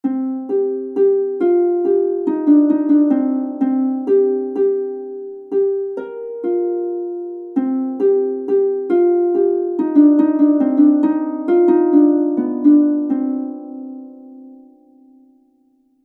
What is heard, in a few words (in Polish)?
Lira